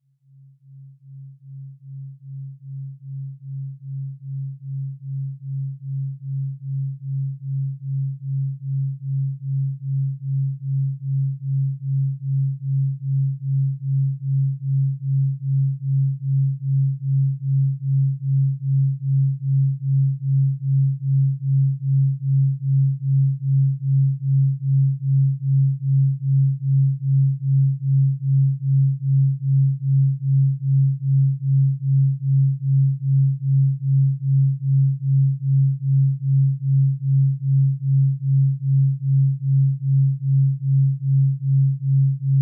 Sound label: delta, binaural beats